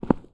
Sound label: Footstep Stone Heavy